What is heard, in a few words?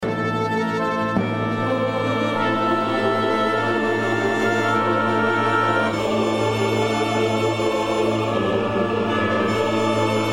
Synth